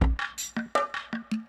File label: PERCLOOP ETHNO 160